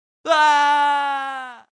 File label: fall to death male